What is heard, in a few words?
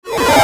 Digital; Adobe; Multimedia Internet CD-Rom Flash